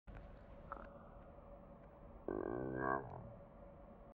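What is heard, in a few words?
Gurgling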